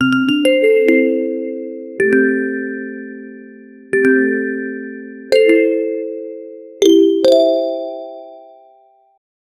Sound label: mobile game ui reward sou, chime